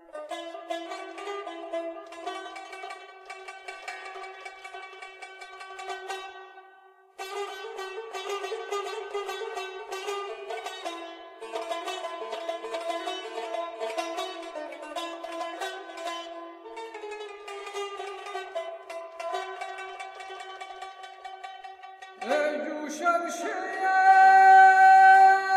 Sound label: bayati kurd; scene4